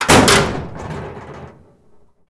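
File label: destruction metal locker small; Explosions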